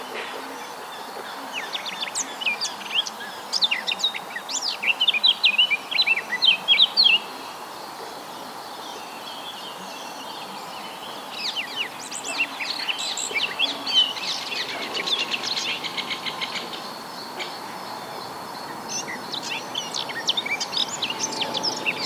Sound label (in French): fauvette tete noire